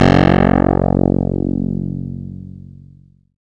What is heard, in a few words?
SYN PULS SY, Microwave; Sound & Vision - Gigapack I CD 2